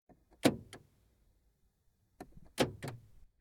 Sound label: Car Car Door Locking From Inside